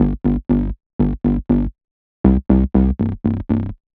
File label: Dub Bass; Sonic Foundry (Sony Creative Software); The Electronic Music Manuscript Collection; Bass Foundations